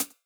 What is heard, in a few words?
hats C, hip-hop drum kit